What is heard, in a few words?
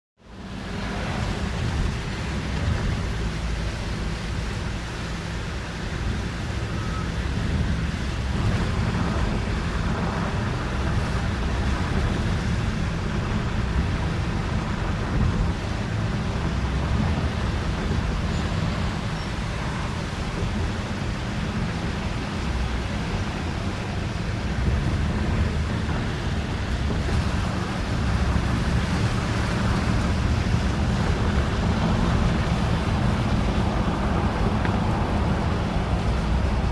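Interior Car Driving Fast On Wet Road, Two Lanes In One Direction, Window Open. Interior Rumble With Light Rattles, Wet Hiss Outside, Truck By Same Direction At Tail.; wet traffic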